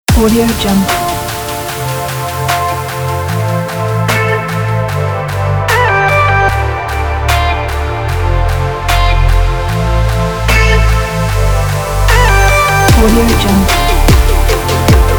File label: explosive future bass